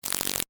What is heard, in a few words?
Electric Zap Buzz, Shock, Science Fiction; Zaps; BlastWave